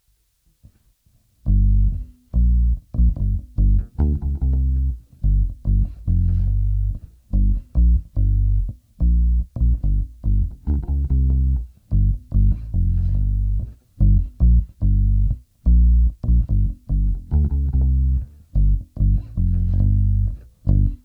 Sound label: Bass